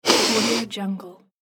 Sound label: male nose sniff